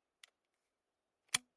Sport Hunting Weapon Shotgun Breaking Barrel Ejecting Cartridges